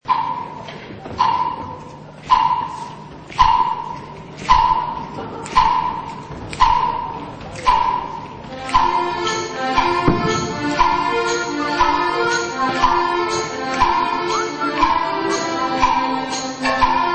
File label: Swinging